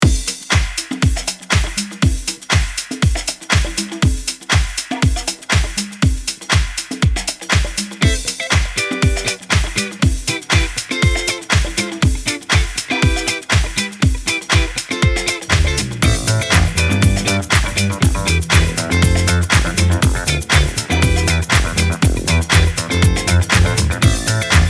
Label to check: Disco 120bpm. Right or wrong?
Right